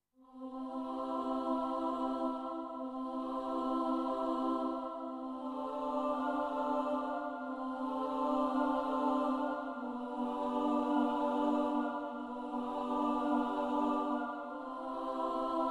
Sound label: Slow Choir